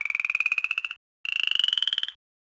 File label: rock frog; World of Tanks Blitz